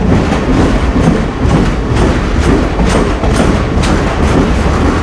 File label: razor train wheels loop, machines; Half Life Model Pack; Half Life 2